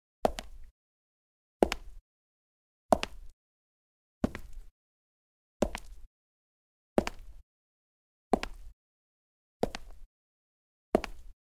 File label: Cement Clean, Foley Footsteps; Sound Ideas